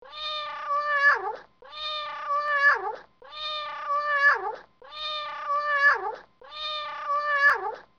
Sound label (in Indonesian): suara kucing mengeong; burungpedia